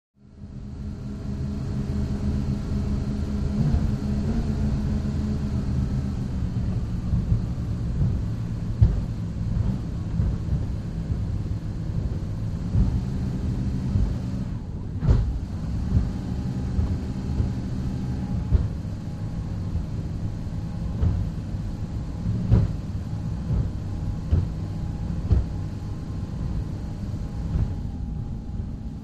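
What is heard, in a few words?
Power Boat Interior Cruise, off shore power boat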